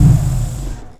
SHUT DOWN

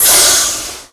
VEHICLES EXTRAS DUMP VALVE

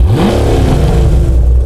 START UP

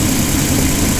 ENGINE IDLE

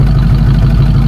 EXHAUST IDLE

